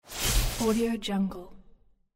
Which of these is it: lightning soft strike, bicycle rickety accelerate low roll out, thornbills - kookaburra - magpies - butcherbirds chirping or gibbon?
lightning soft strike